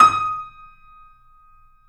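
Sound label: piano, D#, right; InVision Interactive - The Piano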